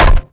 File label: mace